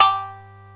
shamisen; AKAI S6000 CD-ROM - Volume 1

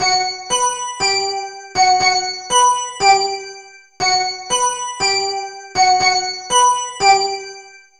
Big City Bells; Sonic Foundry (Sony Creative Software); Club Joints and Street Anthems

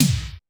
Korg 01W MegaDrums; 300 Drum Machines

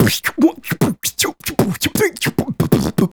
Beat Box, Vocal; Sonic Foundry (Sony Creative Software); Vocal Toolbox

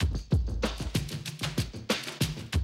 Classic DrumLoops

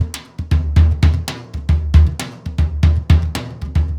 SURDO, DRUMS SAMBA; Best Service ProSamples vol.14; AKAI

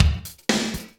Loop, Roland; Sound & Vision - Gigapack I

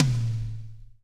DRY TOM, TRASHY KIT; Spectrasonics; Burning Grooves CD2

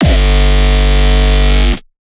Hardstyle, kick

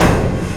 toms kit, industrial loops; USB Soundscan vol.24; AKAI

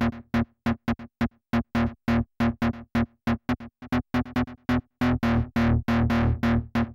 techno 138 bpm; Best Service ProSamples vol.54; AKAI; FM BREAKER

